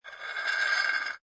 Ceramic Cement Scrape; Scrape Sound Effects; Lynne Music

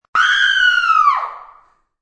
Woman Scream, Single high-pitched woman's scream; Screaming

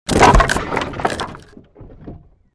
created wooden barrier smash medium; Series of dramatic events based on wooden smashes and collapses; Smash Sound Effects

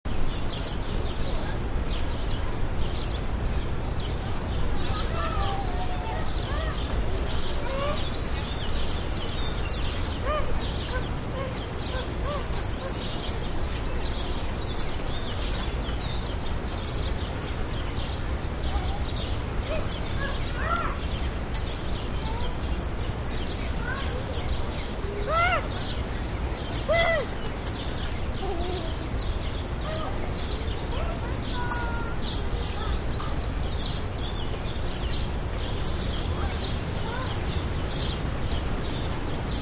City Children Birds Traffic, Park; Playgrounds; In Background

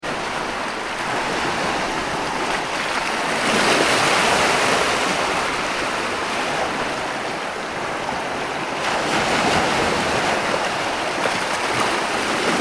water ocean waves rocks light; Calm Ocean Waves Lap Around Rocks; Nature; Ocean Waves On Rocks (Calm) 1; McKinney Sound

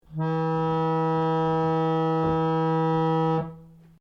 ORGAN PUMP BASSOON E3, Old portable church organ; Keyboards (Sound Effects:Musical)

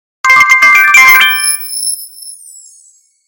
Logo Xylophone Twinkle Ascend Swell; Sonic Logo; Blastwave

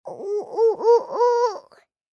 Human Ooh Ooh Ooh, Ooh Ooh Ooh Male Two Yrs Boy; Male Two Year Old Boy; Blastwave

